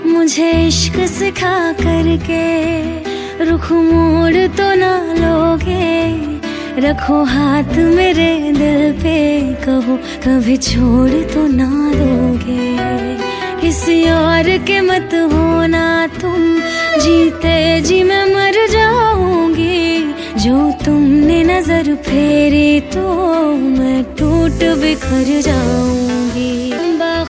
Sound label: romantic ringtone, bollywood ringtones